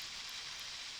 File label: Background Noise